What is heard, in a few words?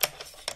cocking lever, AntiTank; weapons launchers; ALAC